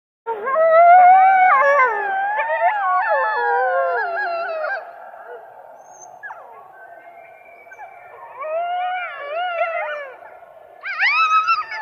Coyote Howls, Barks. Group Howls, Yelps And Barks With Elk Squeals In The Background. Medium Perspective.